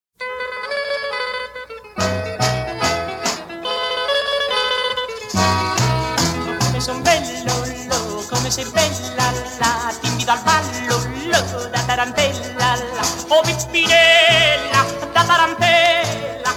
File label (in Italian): Tarantella, Italie; Tarantella di Peppina